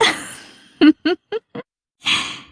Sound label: giggle; featherine